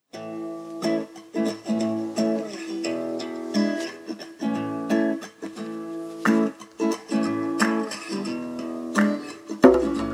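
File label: Song, Russian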